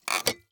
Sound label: Mechanics Creaking Sling